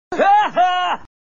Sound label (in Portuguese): ha ha risada; nelson